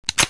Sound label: m2 clip lock